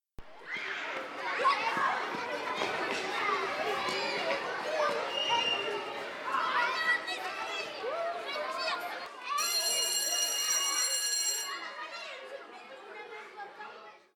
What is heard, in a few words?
Om Bell